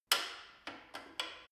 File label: gym bicycle saddle unlock adjust; sport freizeit; fitnessstudio